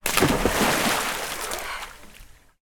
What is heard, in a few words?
splash swimming pool small child jump